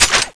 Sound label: mine mode; tfa cso; heaven scorcher